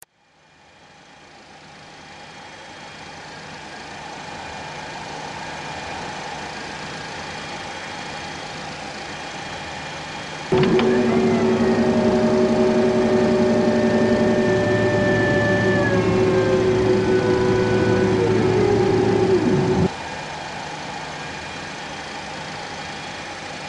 Interior Sound; synchrosoft

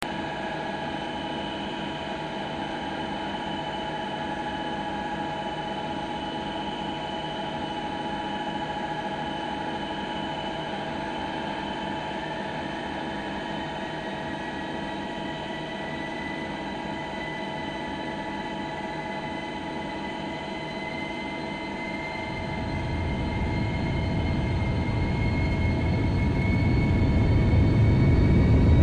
MD11 Interior; Synchro; synchrosoft

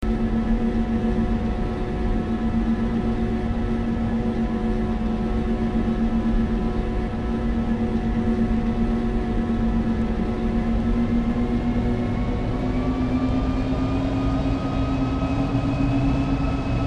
CFM56-5b Interior; Synchro; synchrosoft

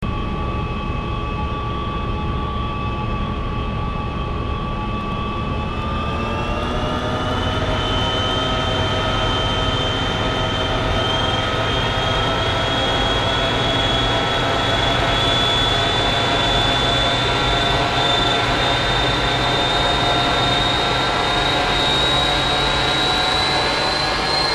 B-52 Interior; synchrosoft

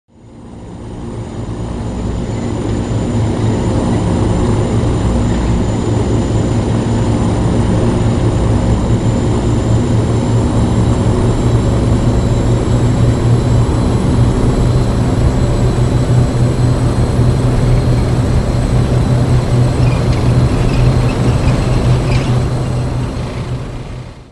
C130; synchrosoft

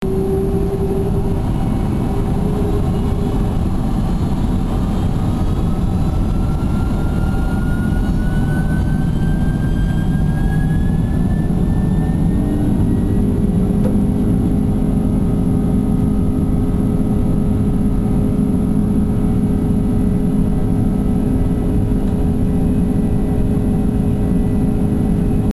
Pw4060 Interior; 767; synchrosoft

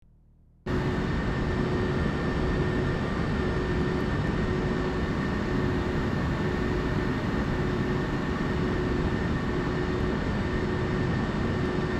Pw4060 Interior, 767; Synchro; synchrosoft

